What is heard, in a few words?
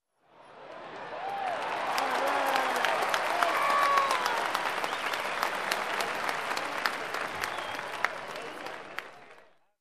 Fans in a game cheering